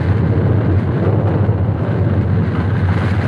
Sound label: lava; dn3d r